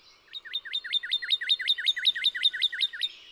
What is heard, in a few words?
wetlands noca